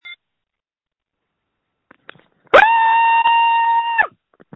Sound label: Scream